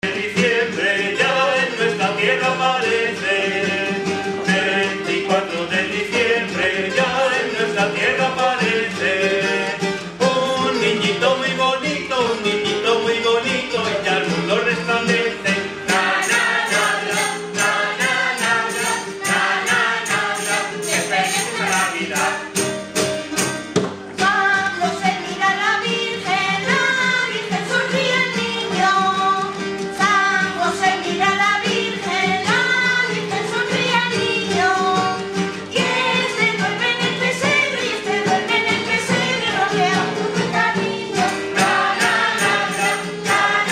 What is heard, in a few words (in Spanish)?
Villancico Castellano; canciones coro